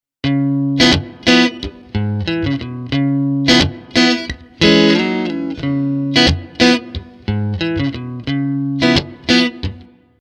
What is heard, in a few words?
Fame Telli Neckpickup